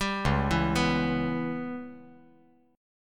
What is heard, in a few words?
Db Minor 7th Flat 5th Db; arpeggiated; chordshape; JGuitar